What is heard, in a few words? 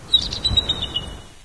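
forest birds; ambience; video games; Source Engine; dod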